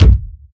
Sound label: kick drum single hit b key, bass drum single shot tuned to the B note